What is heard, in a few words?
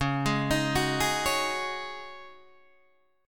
Db Suspended 2nd Suspended 4th Db Guitar Open D